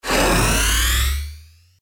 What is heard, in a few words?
powerup